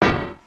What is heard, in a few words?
Spider Cage Yank; creatures organic